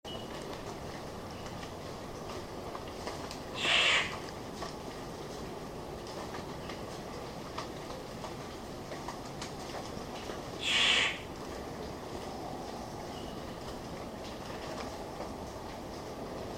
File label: Ptiloris Victoriae; Victoria's Riflebird; Family - Paradisaeidae (Birds-Of-Paradise); Call